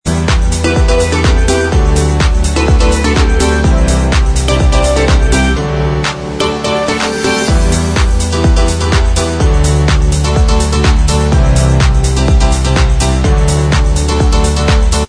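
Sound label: instrumental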